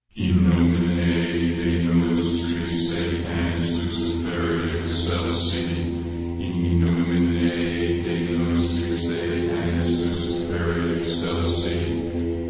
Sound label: Demonic chanting